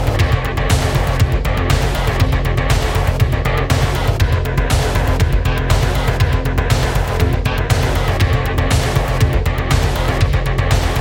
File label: Ringtone